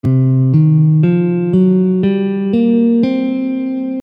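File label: Penta blues C, Gammes & Modes · Guitar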